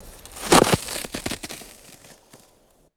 powdery snow falling and scattering

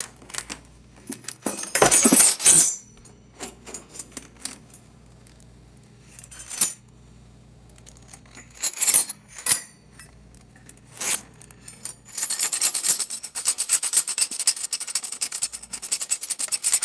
metallic silverware rattling and clinking; foley

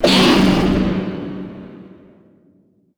fast stuttering metallic cinematic downer

